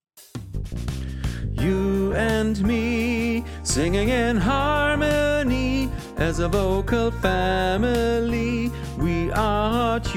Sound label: tenor